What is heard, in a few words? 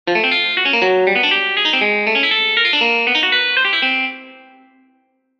sweep